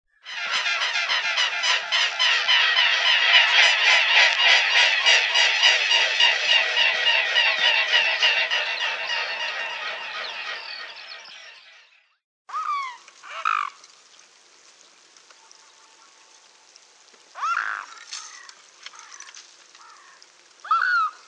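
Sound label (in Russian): lebed shipun golos, mute swan voice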